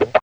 TSCHAK; E-Lab; X Static Goldmine 1

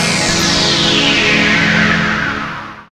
SYN PAD

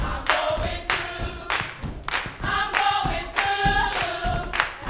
YOUTH CH PHRASE, Vocal Planet Gospel

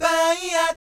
BAYEEAT, Vocal Planet Jazz Fx; Spectrasonics

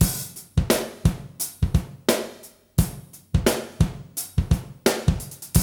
D3 BREAK

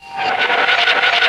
toon

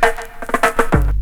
DRMROLL; E-Lab; X Static Goldmine 2

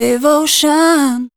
voice spectral, ly short

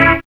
TEKCHD

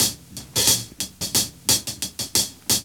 LOOP; E-Lab; X Static Goldmine 2; akai

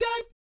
"DAT" A#4, Vocal Jazz; Spectrasonics; Vocal Planet Vol.3 Jazz Fx

